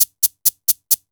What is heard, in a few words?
Cabasa